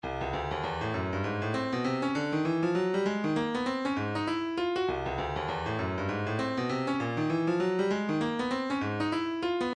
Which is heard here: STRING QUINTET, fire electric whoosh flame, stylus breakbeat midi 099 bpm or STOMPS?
stylus breakbeat midi 099 bpm